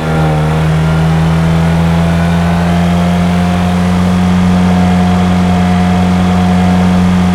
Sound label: simulated vehicles second